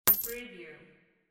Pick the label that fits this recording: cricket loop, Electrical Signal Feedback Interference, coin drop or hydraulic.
coin drop